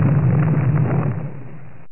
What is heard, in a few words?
wall fall; landslor